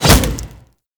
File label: Finisher Punch; Warframe; Kuva Lich; Finishers